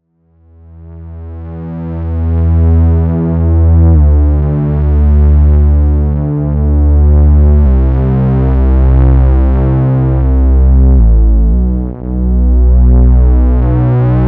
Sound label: bass pwmod; syst100